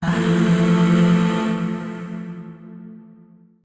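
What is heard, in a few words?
Void Shawzin Major Chord; Warframe; Zariman; Chords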